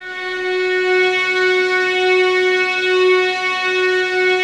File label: VS F#4, Violins; Miroslav Vitous; 11 Violins